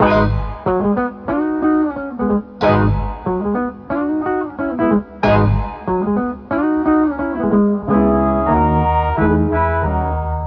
horns, loops, phonky

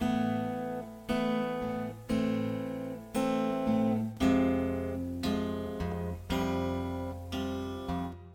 guitar, Sad

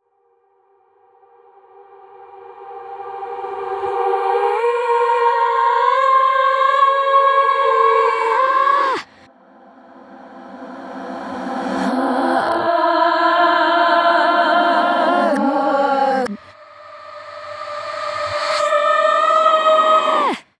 keys, Loops, Sad

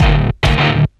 metal

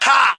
ha, Voice ooh ahh

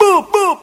boop boop, Voice; ooh ahh

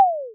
eeper, Toms Electro

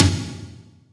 Toms

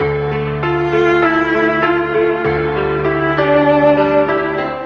piano and strings, Sad Loops

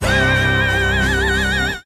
metal squeal

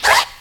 frsh, Swish

